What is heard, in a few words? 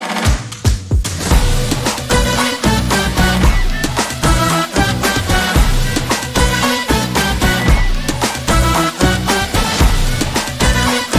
Tamil Movie BGM; Maha